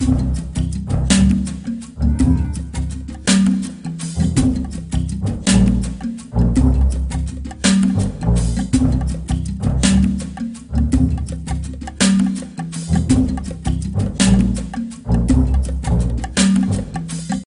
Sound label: Jungle Level